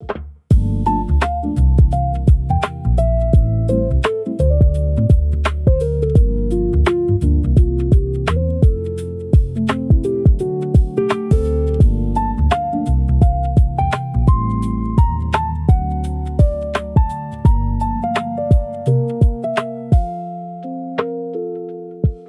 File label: loop; bgm